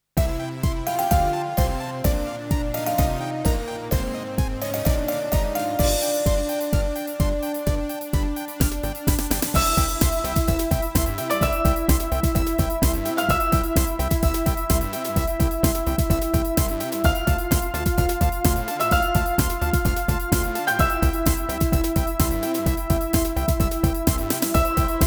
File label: Mechanic Techno (Immediate Victory); Lord Monarch First